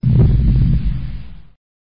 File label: Dinosaur Footstep